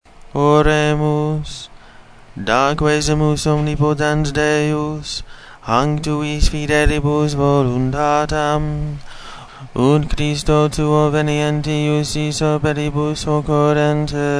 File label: Tonus simplex, chant